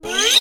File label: cartoon ascend fast slide